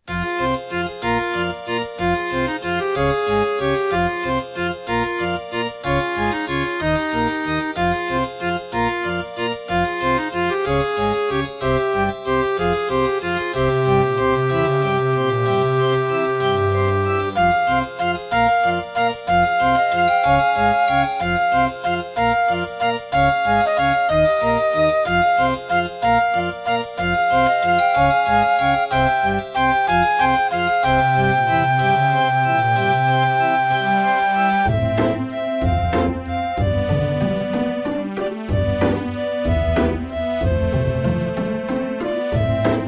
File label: Serdaco Dreamblaster S2; Death Gate